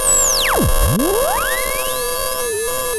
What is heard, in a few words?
sound of a bike bell